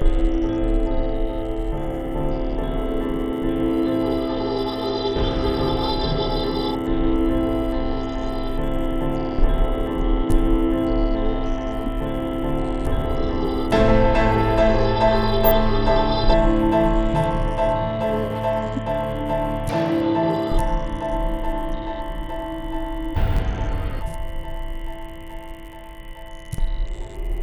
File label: Trainride Stationary Slow